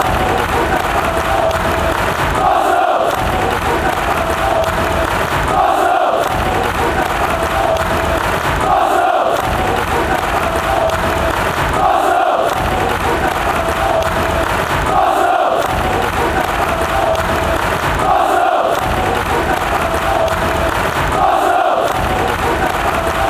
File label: claps, fan chants; arsenal